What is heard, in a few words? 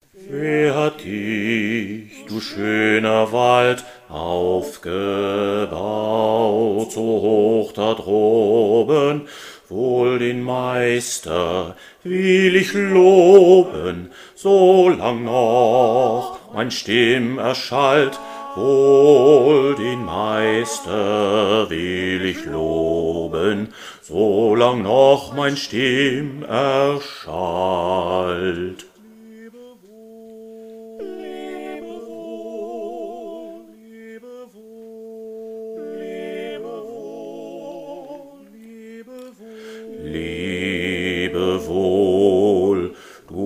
bass; JAEGER-ABSCHIED